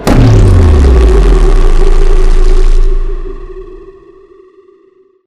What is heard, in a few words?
tower minor pulse fire; boneyard; Titanfall 2